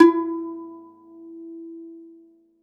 DOMRA, Segundo Domra, Plucked Strings; E-MU Producer Series Vol. 7 – Old World Instruments

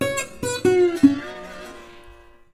Blues Guitar Samples; ILIO - Fretworks

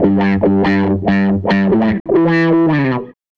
Les Guitar Loop

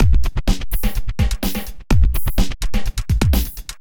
Processed Beat, Drums & Percussion

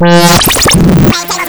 SMOOTH TRANSFOR SINE CHANT, wavetables; waveedit256

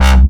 Basses Root C

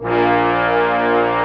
brass A2; AKAI S-Series CD-ROM Sound Library VOL-2

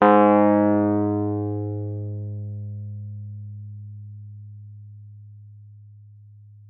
WUFF G#2, WURLITZER; Xsample VOL-1

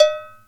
Cowbell; Zoom RT-234; Kit A

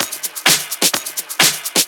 Straight Loop 128 BPM, House

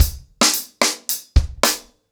110BPM, ACOUSTIC DRUMS

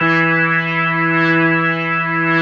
E3S, B3

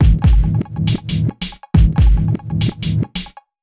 Big Beat Drumloops, 138 BT; USB Soundscan vol.25; AKAI; MOVE 138

